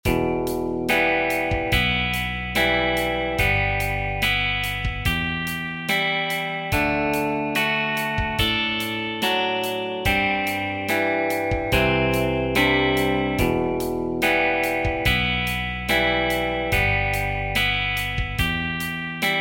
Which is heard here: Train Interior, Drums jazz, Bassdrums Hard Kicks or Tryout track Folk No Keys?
Tryout track Folk No Keys